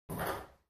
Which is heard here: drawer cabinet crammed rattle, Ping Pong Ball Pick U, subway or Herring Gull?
Ping Pong Ball Pick U